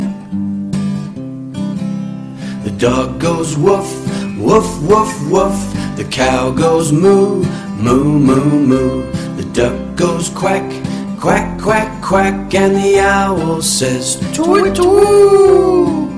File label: kids rhyme animal sounds song ringtone